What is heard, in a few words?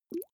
small bubbles single soft